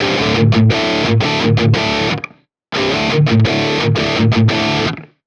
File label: Metal Guitar Loop, 174 BPM D Maj; Cymatics; Vibrations Guitar Sample Pack